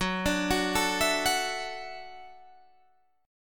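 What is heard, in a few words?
D Major Add 9th G flat, chord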